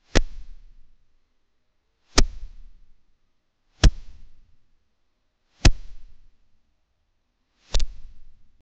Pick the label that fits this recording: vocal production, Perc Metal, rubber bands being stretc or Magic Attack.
rubber bands being stretc